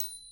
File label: bell